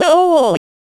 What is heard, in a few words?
pain low; VIVAE